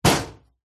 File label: office metal cabinet door close